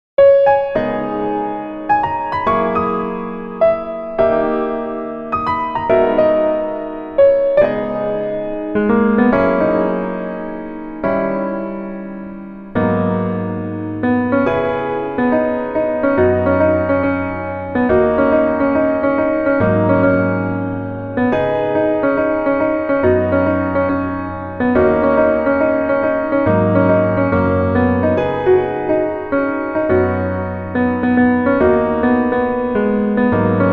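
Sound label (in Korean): Piano version 멜로디MR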